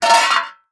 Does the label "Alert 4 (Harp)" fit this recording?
No